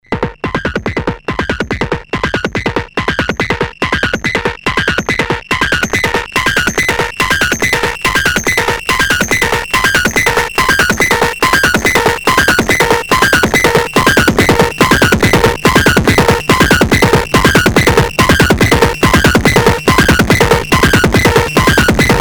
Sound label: TS303 vs MS20